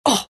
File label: pain; dota; phantom assassin